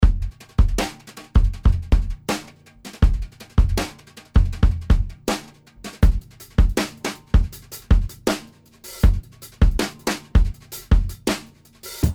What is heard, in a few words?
Funk Drums Loop